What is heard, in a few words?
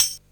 Tambourine Soft, linndrum; classic machines